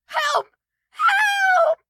town human far; Blind Drive

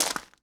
gravel; firefight

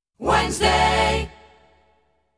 Wednesday, jingles

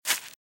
Velociraptor - Footstep - Grass - Run - Left

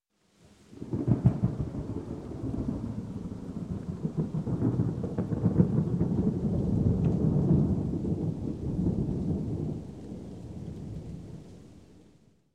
Thunder, Multiple Rumbles; Sound Ideas 2000 Series

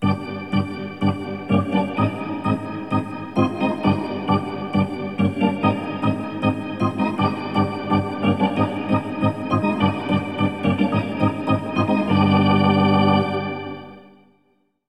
Hockey Organ

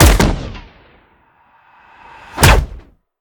Bullet Extraction; Plastic Surgery

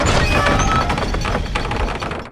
Door Large Wood Castle Open; Doors

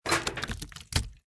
Small Cage; Blind Quest - The Frost Demon

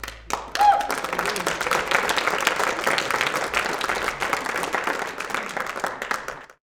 applause small; best service Blue Box

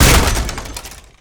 Explo Small Plastic; Destruction

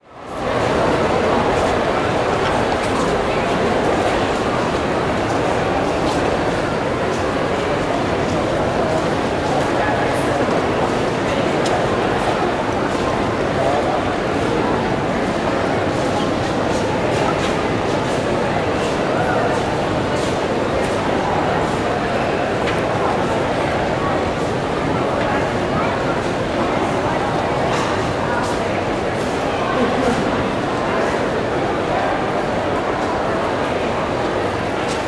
Station Large Train, Walla; Sound Ideas; Walla Series

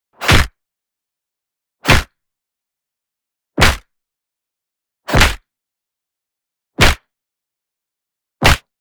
PUNCH Slap; boom library; Anime Essentials

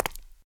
concrete; firefight

